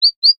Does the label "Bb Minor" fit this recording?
No